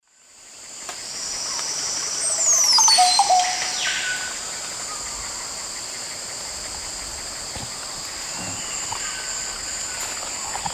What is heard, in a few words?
Psarocolius viridis, song; S. America; Eastern Lowlands